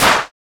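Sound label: PER PROCU, Procussion, percussion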